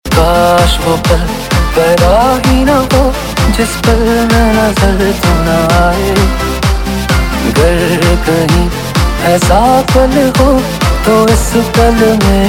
EDM Remix Ringtone; Bollywood; LoveShhuda

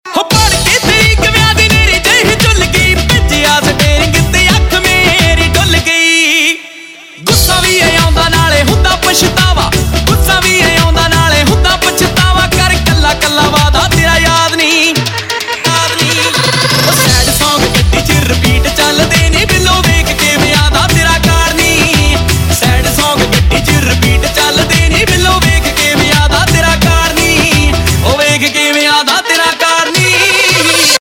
Bhangra Ringtone, Punjabi

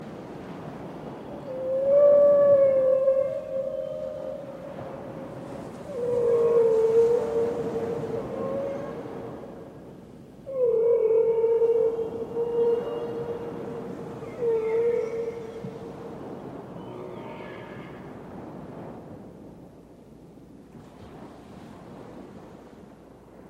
whale; Pets And Animals Ringtones

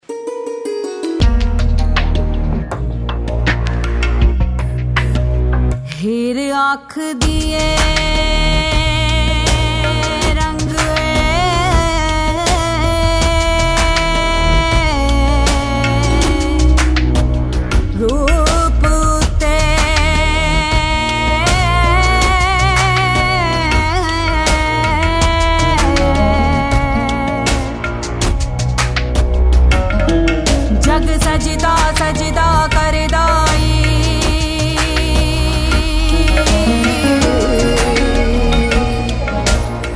TV ad Punjabi song; Zoya diamonds Crafted to Inspire Banaras

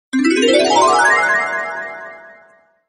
3d Magic Sms

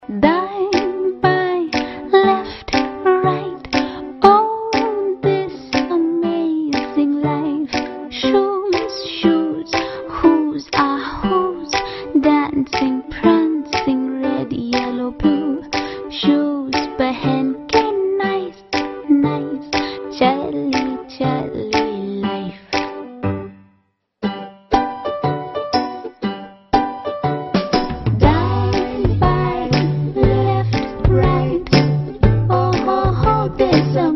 Tv ad song; Bata Spring Summer 2014

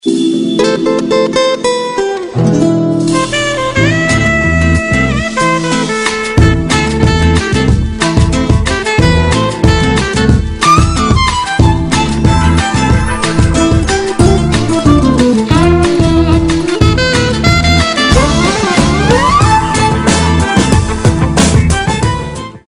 TV ad music; Jaquar lights

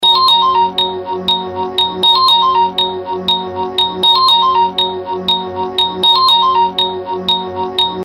Simple beep tone used in TV serials

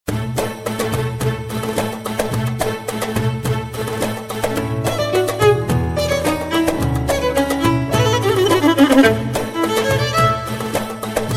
Nokia Oriental

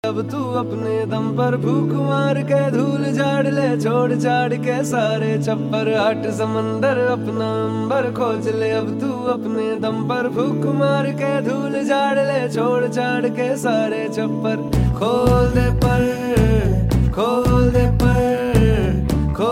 Instrumental Ringtone; Bollywood; Hichki

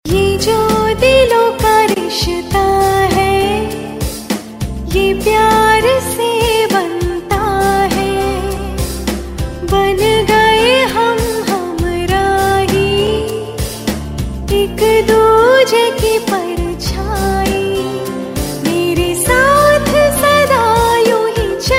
Title Tone; TV Serial Tone; Sony

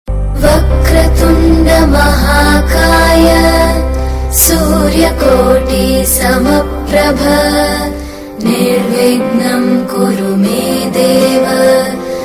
Vakratund Mahakay (Female); Ganesh Chaturthi Special Ringtones

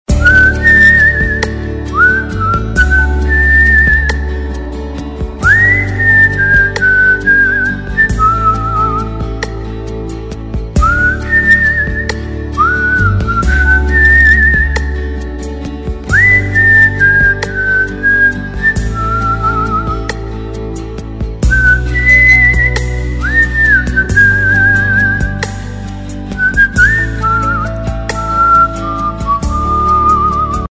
Title Song Instrumental (Whistle); Humsafars; Sony

